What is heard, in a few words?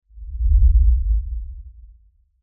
Lfe Rumble By Swell Low Bass Movement; Sound Design